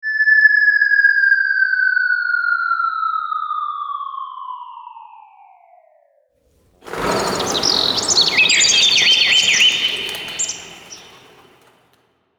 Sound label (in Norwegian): Bounce høyt fall